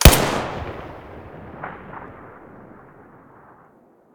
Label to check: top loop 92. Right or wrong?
Wrong